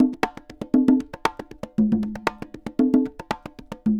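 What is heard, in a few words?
CONGAS SALSA, salsa drums and percussion; Best Service ProSamples vol.14; AKAI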